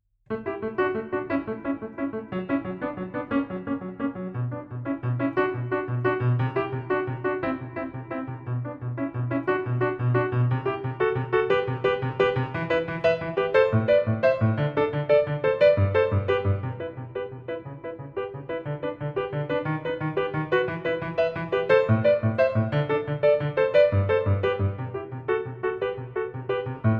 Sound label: piano